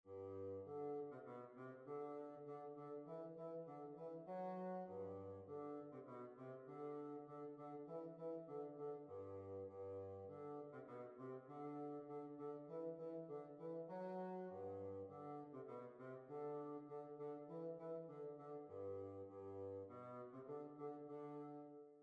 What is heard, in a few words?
German Dance